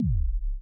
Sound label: kickdrum